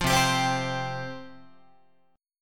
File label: C Suspended 4th C down Guitar; Csus4 strummed; 8,8,10,10,11,11; JGuitar